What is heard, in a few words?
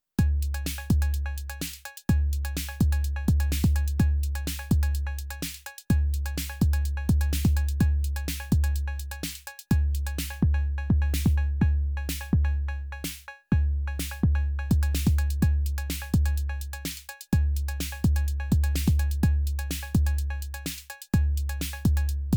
drumbrute impact